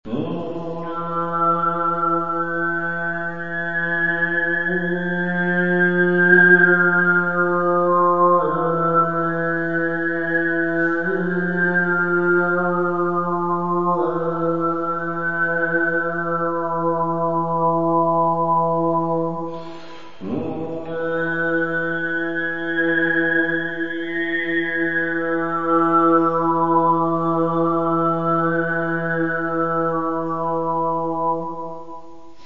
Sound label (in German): Birdmelodie, Didgeridoo